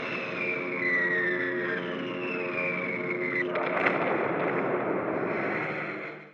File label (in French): Bombardements finaux